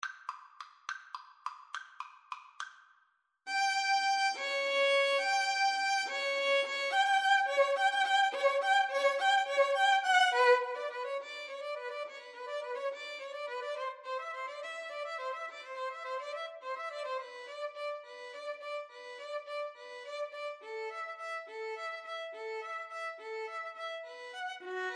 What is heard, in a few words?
violin cello, Violin-Cello Duet; danse mac saint sa